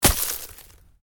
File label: Ice impact heavy, Ice: heavy impact with debris; Snow; Weather